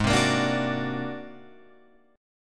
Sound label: G sharp 9th Flat 5th G sharp Guitar Open F; G#9b5 strummed; 8,7,10,9,9,9; JGuitar